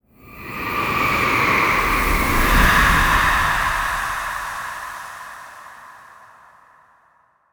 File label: Funeral Fade